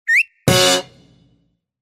korotkij svist i prizemlenie s klaksonom

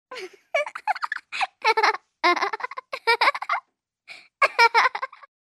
hihikane smeh

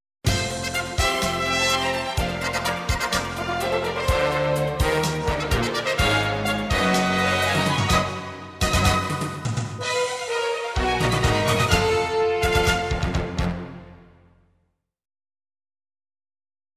Fanfary nagrazhdeniya vyhod; Награждение, выход; Звук фанфар на начало праздника, на выход ведущих, или перед награждением

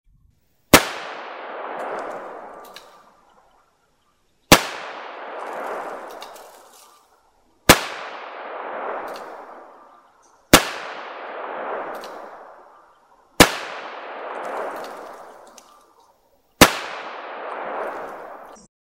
sw model 10 odinochnye

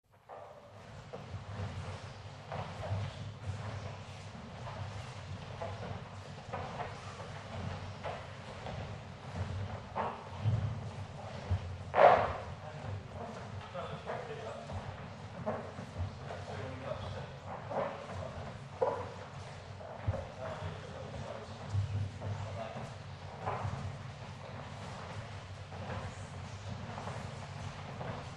hozhdenija na kolenjah

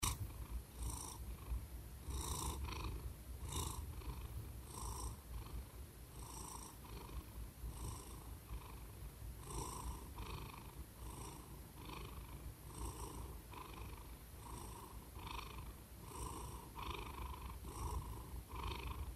kot murlykaet potom ukladyvaetsja poudobnee i vzdyhaet

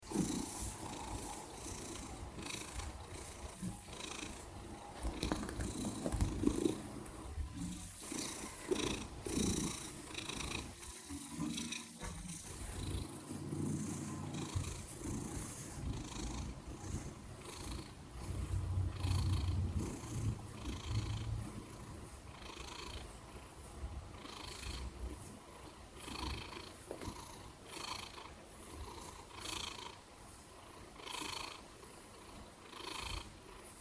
rovnoe umirotvorennoe murlykanie kota

